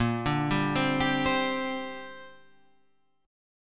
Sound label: Bb 6th Add 9th Bb